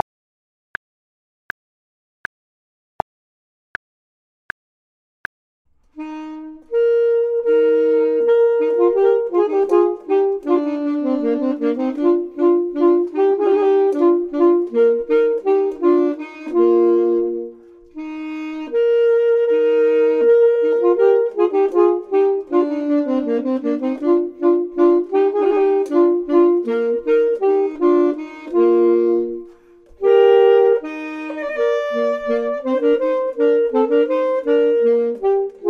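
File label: both, Saxophone and Clarinet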